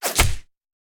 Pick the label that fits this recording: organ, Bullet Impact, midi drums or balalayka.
Bullet Impact